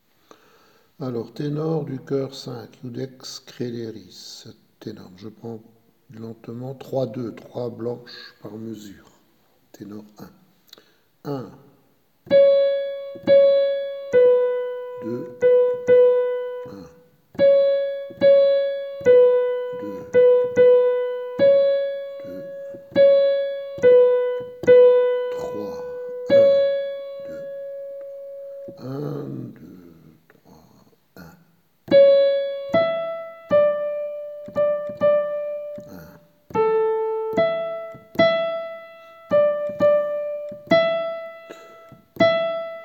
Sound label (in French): Choeur Tenors